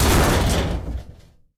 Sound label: barrel collide; STALKER; IWP